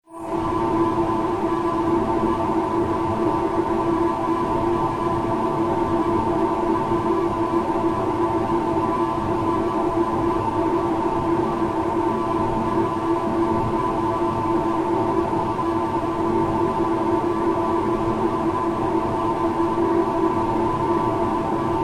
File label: Industrial Ambience SciFi Spacecraft Engine Room Ambience Tonal, Industrial Ambience Spacecraft Engine Room Tonal Airy; Factory; Backgrounds